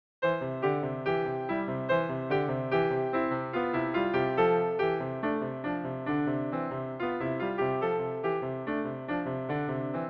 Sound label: Dance Song; libri di pianoforte per i corsi di base